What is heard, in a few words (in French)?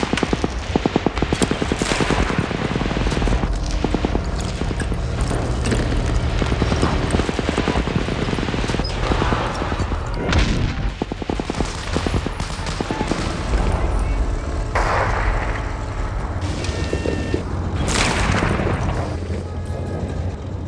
eglise country